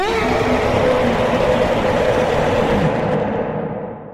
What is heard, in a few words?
apocalypse bird